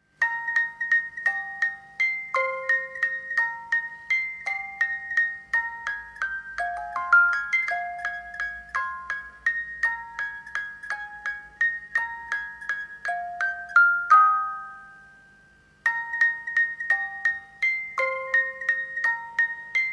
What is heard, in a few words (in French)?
boites musique